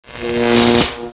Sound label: DROWN; openffa; hiro